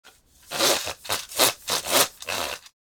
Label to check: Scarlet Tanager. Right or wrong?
Wrong